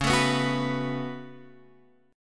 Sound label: C sharp 7th Suspended 4th Sharp 5th C sharp Guitar Standard; strummed; chord; x,4,7,4,7,7